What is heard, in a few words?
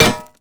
basic attacks; Dr. Mundo; TPA Mundo